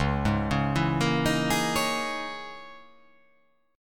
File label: C sharp Minor 6th Add 9th C sharp